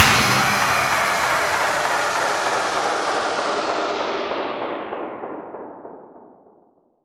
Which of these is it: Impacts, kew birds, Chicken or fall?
Impacts